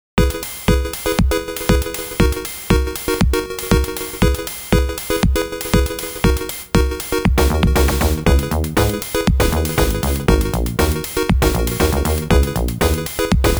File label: FM Instrument Version; Power Dolls 1; Resonance